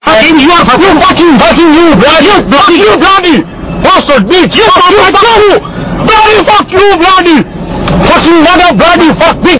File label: bloody bass